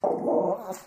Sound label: Dogs Small Dog Growls